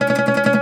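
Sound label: OUD tremolo, Plucked Strings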